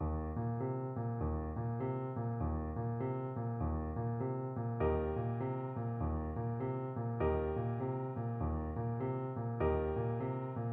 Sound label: clarinet